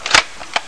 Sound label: aw50 clip out